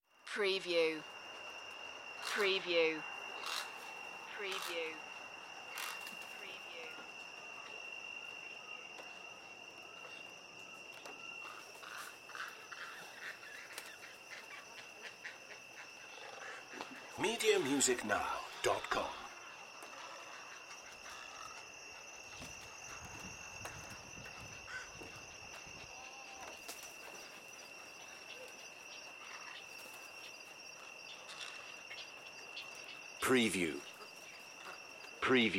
Marsh Ambience Night Spooky Long

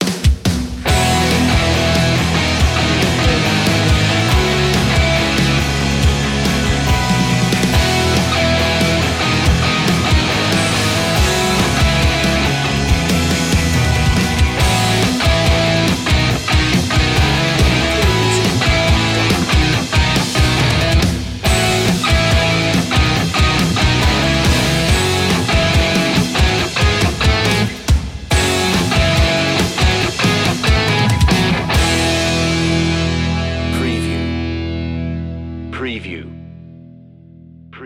the race instrumental

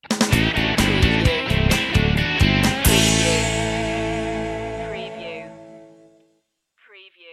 blues rock sting

